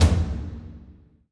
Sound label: drums